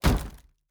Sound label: Shield Wood; Fantasy Sounds Bundle; Medieval Combat Sounds